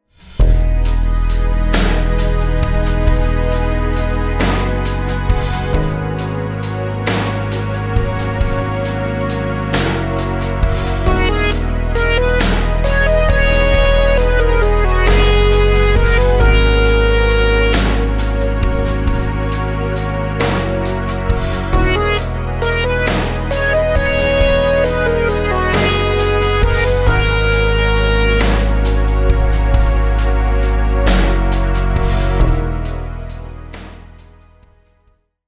rhythms compound 8s w16s

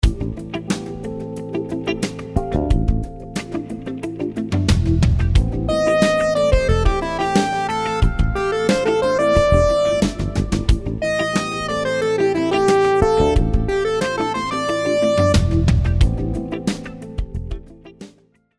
rhythms 16s syncopated

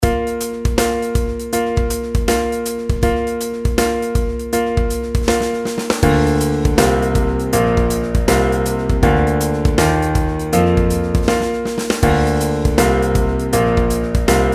pitch patterns stepwise

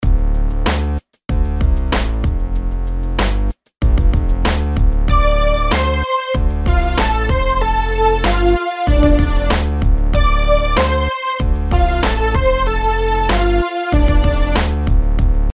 rhythms eighths

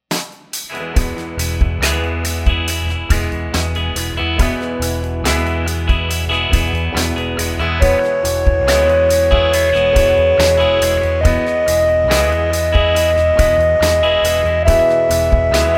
scale degree

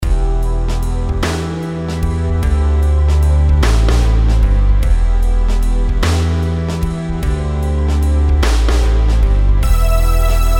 rhythms compound 8s

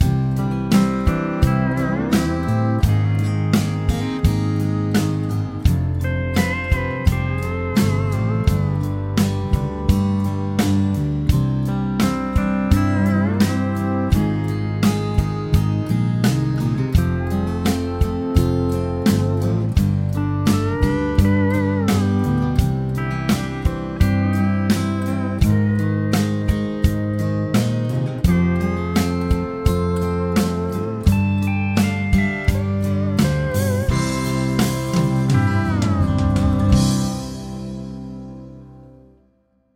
chord prog with mixture

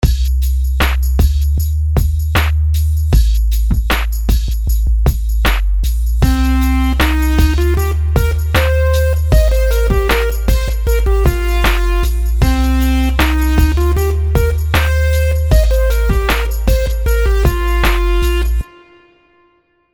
rhythms simple 16ths

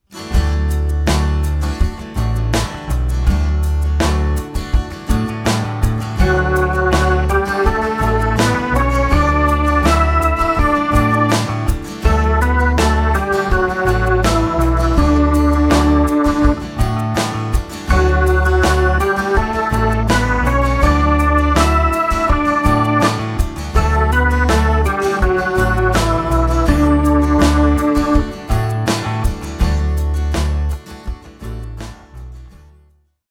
melodic dictation

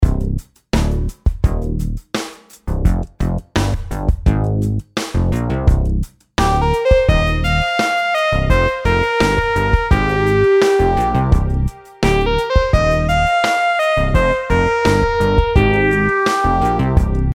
rhythm simple 8s triplets

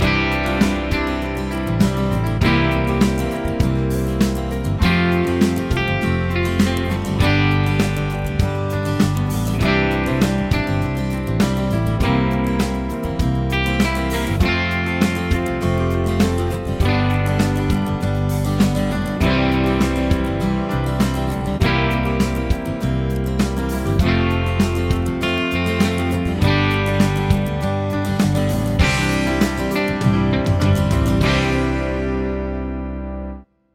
progs with applied chords

